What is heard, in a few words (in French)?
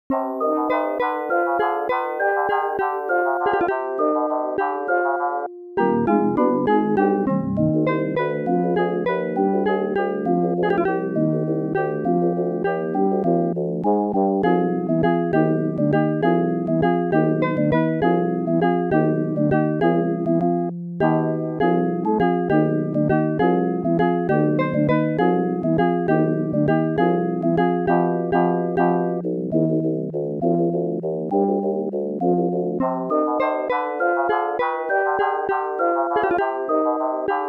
LA VALSE; Xanadu Sound Collection